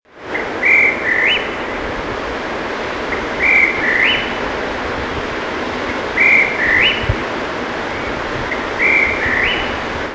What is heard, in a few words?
song